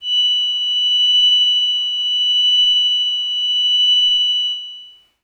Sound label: Glass Howl Kawaii B6; pads